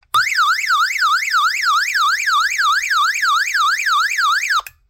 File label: Ambulance, beacon light; red beacon light; meltric